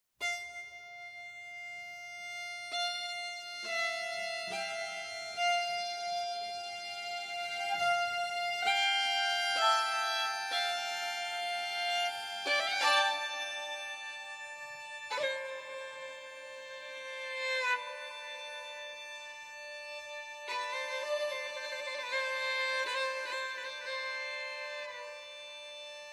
Kamancheh Ascending Bird

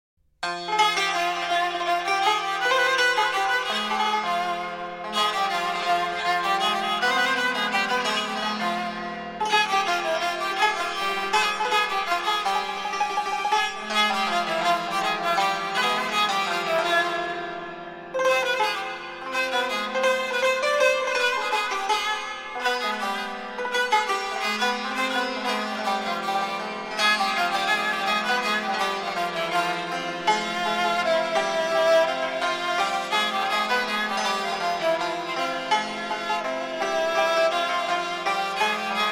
Ahang Santour Range Homayoon

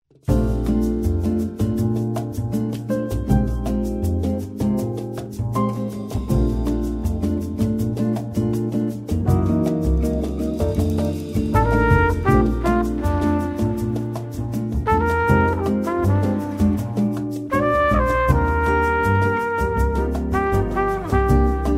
Trumpet

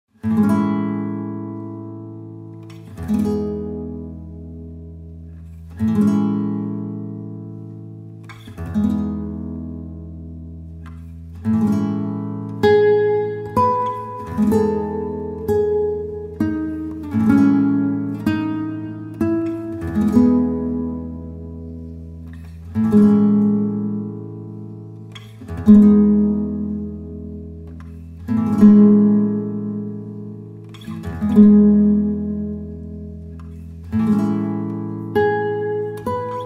Peaceful Guitar

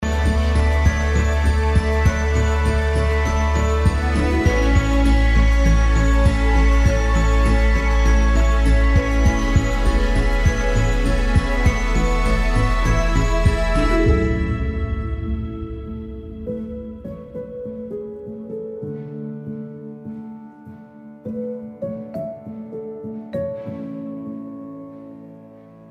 Relaxing Songs

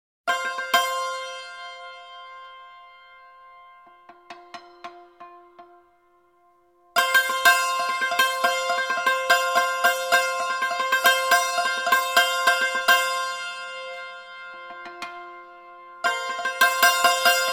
Ahang Santour Rain Drop

